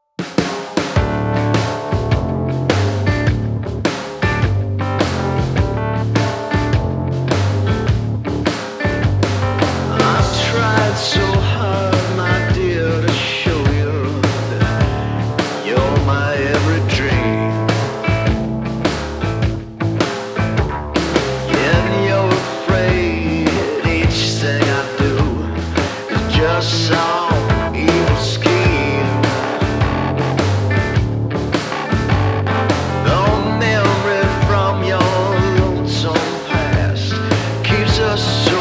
rock overdriven loose, drums

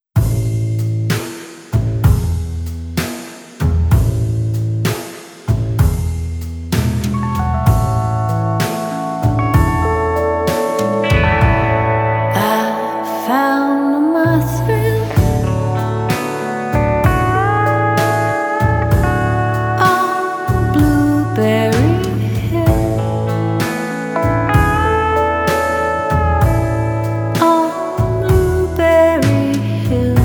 lush brushes open

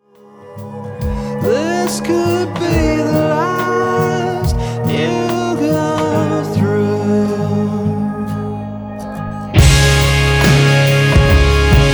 rock huge open, drums

